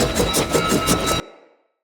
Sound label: Short Sword Throw Perfect Loop; Weapons; Player